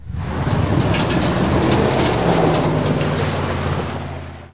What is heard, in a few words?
Auto Tires Peelout Rock; Automobiles